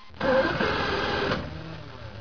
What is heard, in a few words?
nautical